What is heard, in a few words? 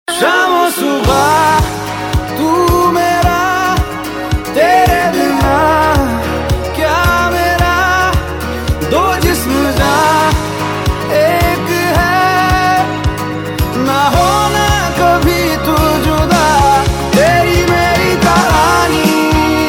Hindi Ringtone